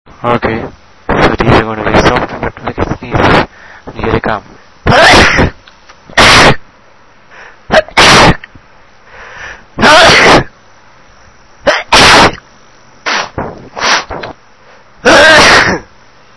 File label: loudest, male